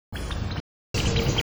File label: chitter, degu